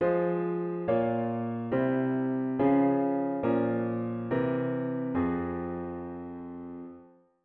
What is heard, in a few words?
root V7 bass